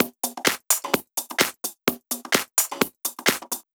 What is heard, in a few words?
Loop, 128 BPM; Mutekki Media; Vengeance Electroshock Vol.2